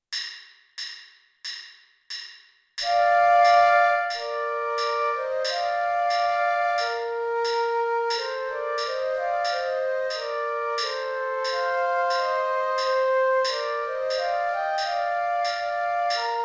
flute

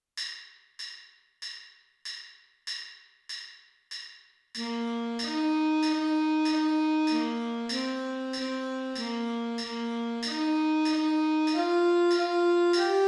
bingo alto sax

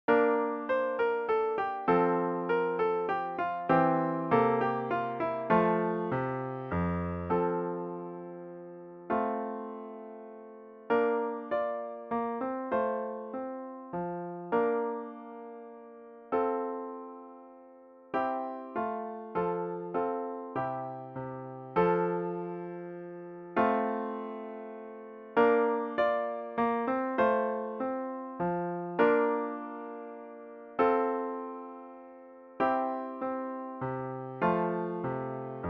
orchestra bells piano only; minuet

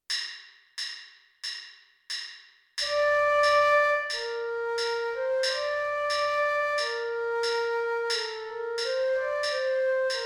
flute

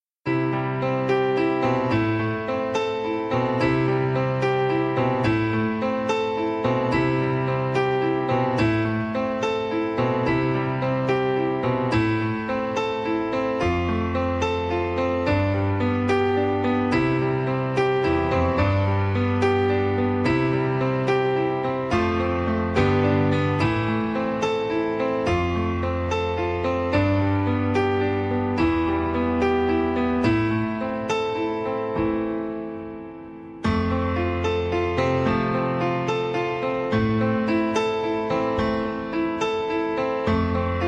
karaoke instrumental

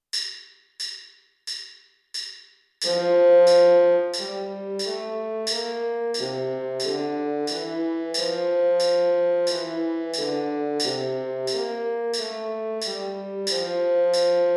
f major scale trombone baritone

